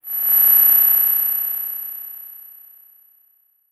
Production Element Title Transition Communication Transmission